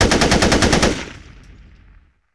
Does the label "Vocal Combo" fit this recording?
No